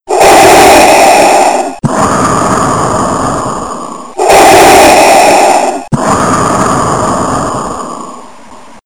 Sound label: star wars darth vader breathing